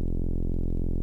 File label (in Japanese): ミニ・モーグ, 電鳴楽器; 最低音４音色